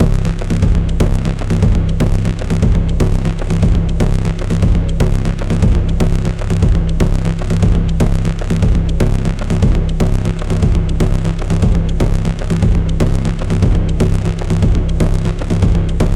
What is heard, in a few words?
futuristic alien military, tribal